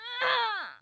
female death, human

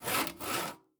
construction saw

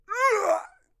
male death

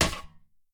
arrow metal, attack impact

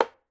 sling wood, attack impact

